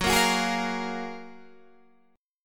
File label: Gb Major 9th, down, Guitar, DADGAD